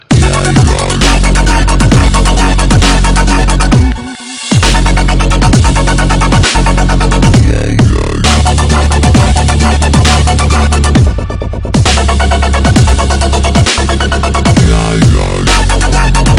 dubstep remix

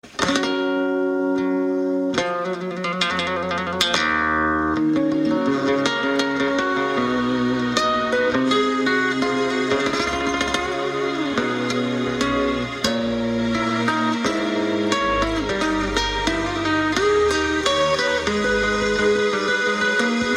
air on the g string

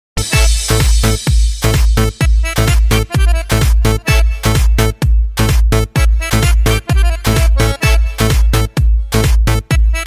hot dance music

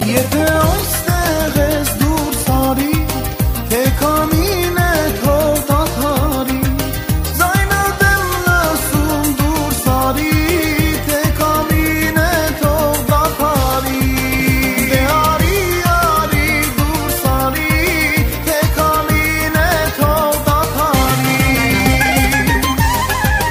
armenian song

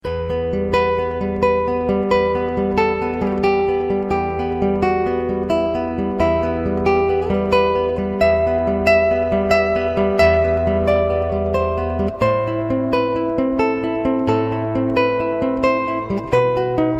romantic guitar

